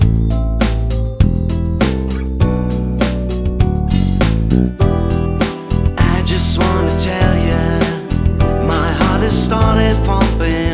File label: Guitar jam